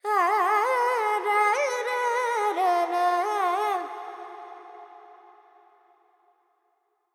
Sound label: FX Dorian, Vocals, Carnatic Female, Alaps, 70 G